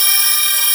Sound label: item sword of the divine loop; LoL